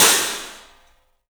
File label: CYFX, EFFECTCYMB; 3D Sound; 3D Drums